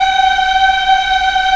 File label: VOX African; Roland LCDP11 Africa VOL-1